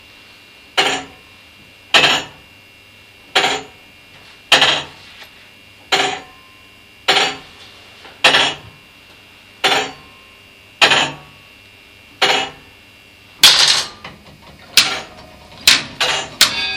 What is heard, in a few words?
chimes, sergiyev posad